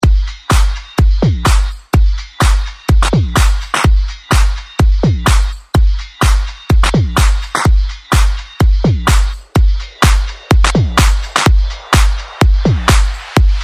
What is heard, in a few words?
Mashup